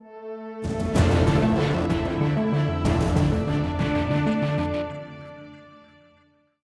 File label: 190bpm; Legends of Pegasus; windows gamerip 2012